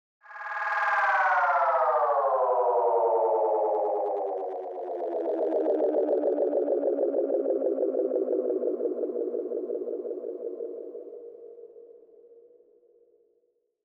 174 Cm Space Echo Slowdown; Luther

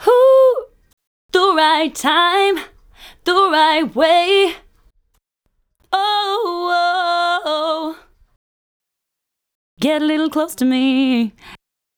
123, Cm

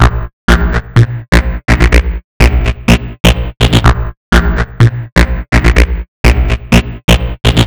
125bpm Synth A-

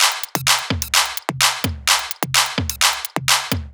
Top Loop 128 BPM

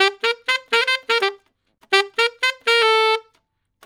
sax riffs alto 124 C

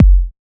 KICK DEEP

